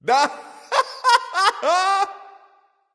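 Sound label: "heh heh", nonverbal; smite; voicepack; khepri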